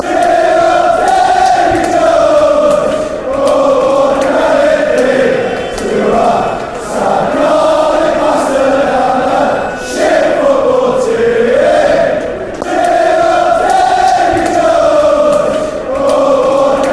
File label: cheer, fanchants; david jones